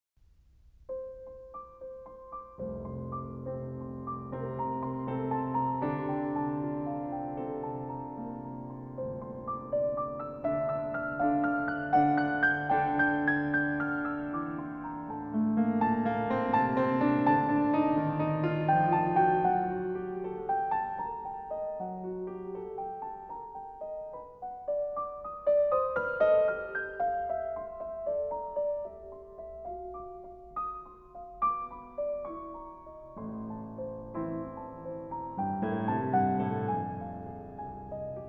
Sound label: piano